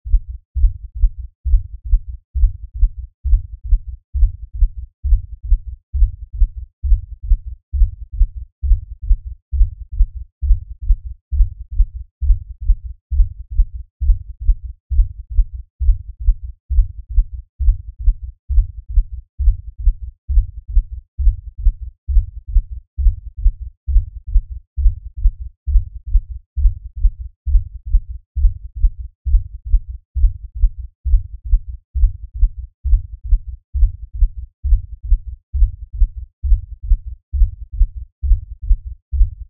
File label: Lfe Pulse Low Bass; Drone; Sound Design